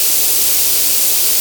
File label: steam loop